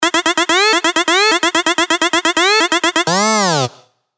dirty dutch leads; Spire; Moombah Starts; revealed spire dirty dutch leads vol 1

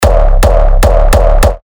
Kick C#; Dredge; Revealed Terra Kicks

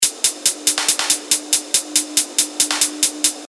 140 BPM, techno loops; Amerikamura; revealed techno top loops vol 8

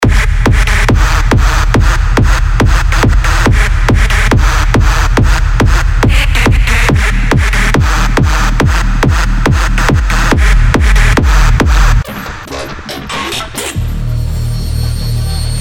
Glitch; Mother Of The Glitch; Revealed Serum 2 Rave Asylum

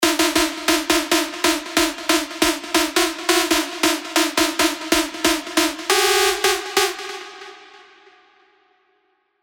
Screech; Lambo; revealed spire mainstage drops

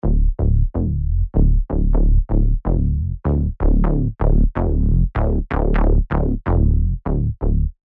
BS, serum 2 bass tech house; Green Velveteen; revealed serum 2 bass tech house vol 1

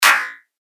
Clap Glitcher; revealed claps snares vol 2

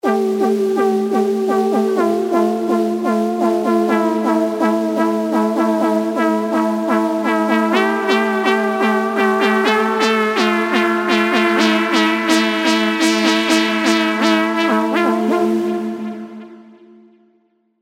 Capricorn, keys; revealed diva keys vol 2

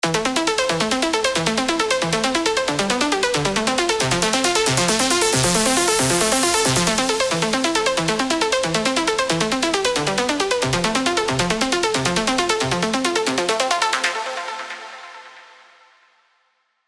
Cluster Pluck, Serum 2 plucks; Revealed Serum 2 Plucks Vol. 1

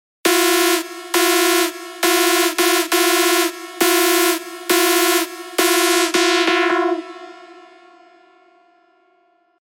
Electric Burst; Revealed Serum 2 Big Room Techno Drops Vol. 1

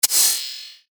FX Hat, cymbals; revealed cymbals vol 1

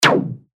Ultra, percussion; revealed percussion vol 3

